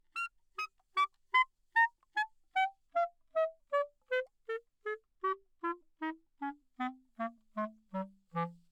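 staccato descending, anechoic classical clarinet c; OPENAIR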